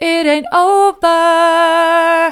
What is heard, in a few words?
it an't over soft, vocal